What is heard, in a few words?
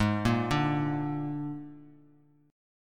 G sharp Suspended 2nd Flat 5th, Bass Standard; G#sus2b5; arpeggiated; JGuitar